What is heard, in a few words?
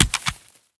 pistol drop grass; STALKER; IWP